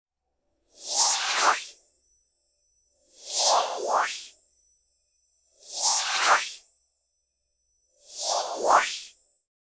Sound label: soft magical hair movemen